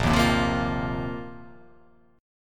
A sharp Suspended 2nd Suspended 4th down

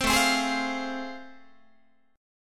A Minor Major 7th Sharp 5th, Guitar; strummed; AmM7#5; 15,17,15,18,16,16; JGuitar

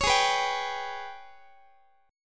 G sharp Minor Major 7th Double Flat 5th, Guitar; G#mM7bb5 strummed; x,x,16,18,15,18

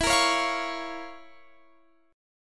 F Major 7th Suspended 4th Sharp 5th E

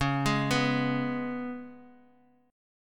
Ab Minor Double Flat 5th Db

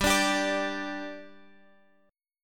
G 5th G, chord; G5 Chord {X 10 12 12 X 10} strummed

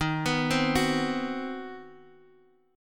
C Minor Major 7th Flat 5th D sharp, chord

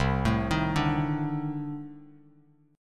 C Minor 6th Add 9th C Bass Standard; Cm6add9 arpeggiated; JGuitar

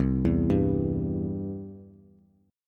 C Major, C, down, Bass; arpeggiated; chord; JGuitar

